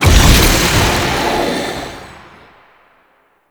Cave Combat Shadow Despawn; Warframe; Duviri Murmur; Cave Combat Encounter

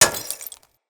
Bullet Impact Glass Large; Weapons

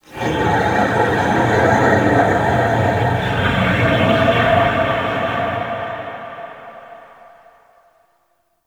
Forest Distant Creature Large, Ambience; Warframe; Grineer

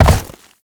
land snow; Movement; Warframe; Uriel

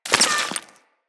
Bullet Impact Debris Rock

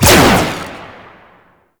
Jet Turbine Pistol Shot

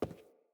Boot Walk Wood, Footsteps; Enemy; Sinecure; Melee Grunt; Boots

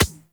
Beach Ball Bounce Dirt; Beach Ball Floof; Water Fight

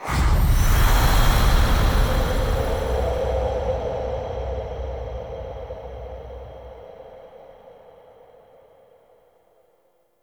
Faction Choice Finalized, UI

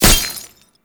Tank Weakpoint Impact; Enemies; Gameplay; Lasrian Tank; Warframe

